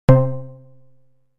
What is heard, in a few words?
Three Pulses, synth lead